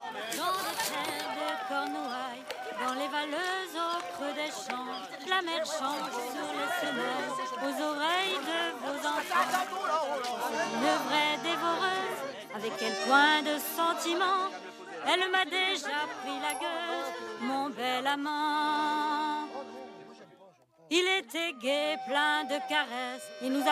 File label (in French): Chanson du quai; L'île de Black Mor